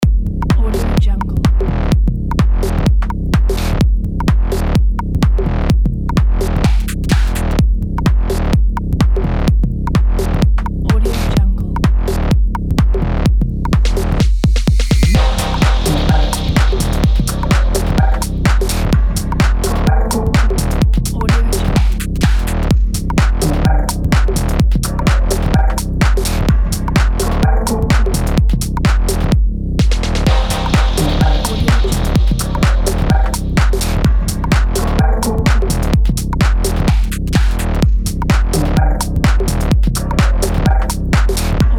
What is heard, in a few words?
techno dark minimal